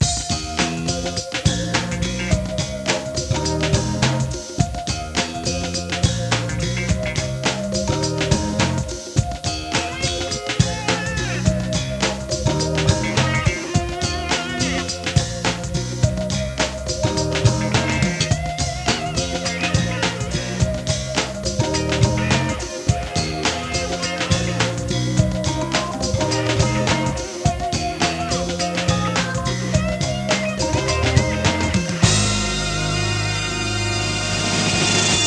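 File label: guitar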